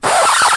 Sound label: record scratch oops